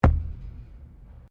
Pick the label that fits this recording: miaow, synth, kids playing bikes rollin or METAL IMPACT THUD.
METAL IMPACT THUD